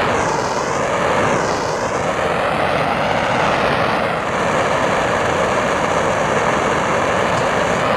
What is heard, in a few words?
fire jet small; Titanfall 2